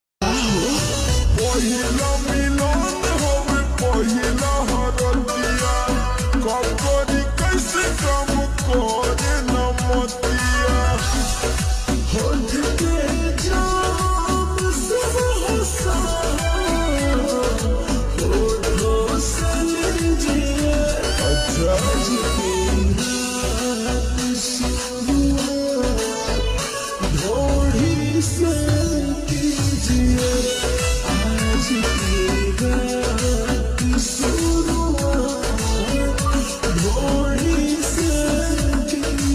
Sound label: Ringtone, slowed and reverb